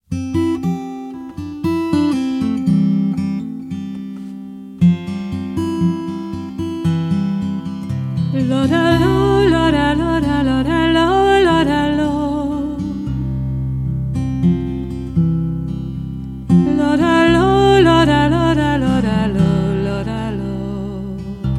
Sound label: - Female